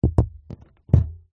vehicles windshield wiper lift release down internal pov